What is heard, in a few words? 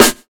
good snare one shot a sharp key